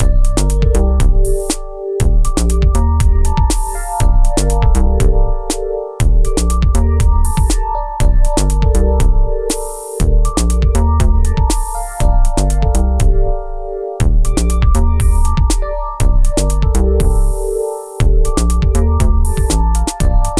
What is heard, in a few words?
Loop